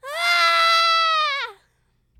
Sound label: Yell